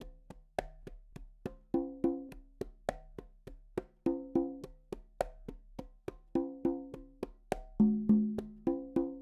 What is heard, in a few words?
104 Dance Congas; Percussion; CongaBongo; Pro Tools Essential Loops; Digidesign; Dance DJ